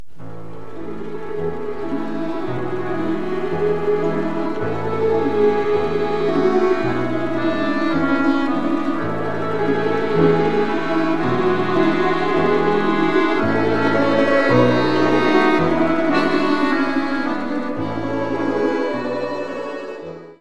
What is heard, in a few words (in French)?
Chorovod, Russie